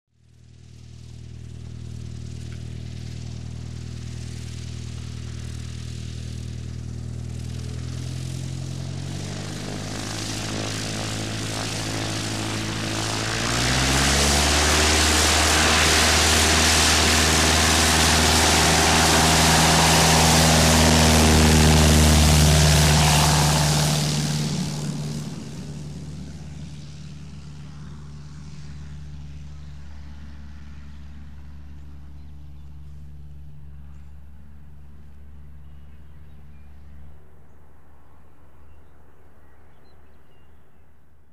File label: Cessna Prop Plane Tak